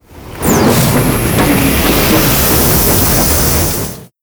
gas tank flame; combine soldier

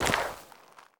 sand, footsteps

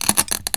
glass strain; physics

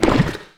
paint blob inception; Portal 2

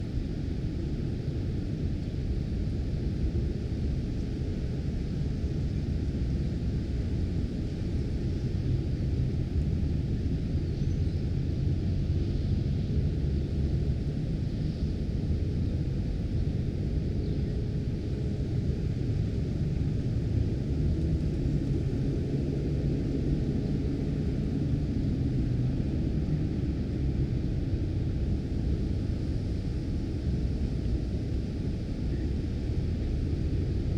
exterior; ambient; Counter Strike Model Pack; CS:GO; nuke

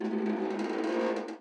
cable stress; dust2

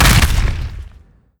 body impact soft, antlion; creatures

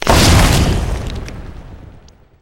xen grenade explode; infestation; Half Life Alyx

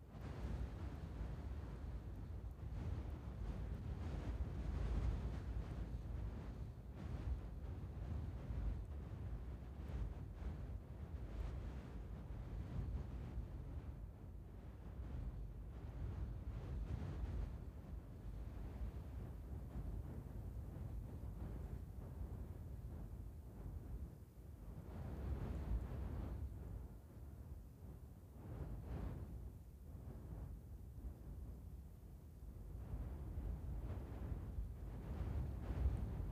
wind strong; ambient; dust2

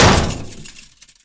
chainlink bash; scripted sequences, a2

